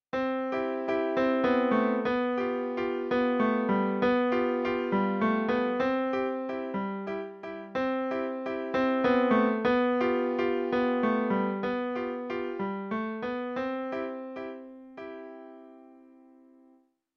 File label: Waltz Time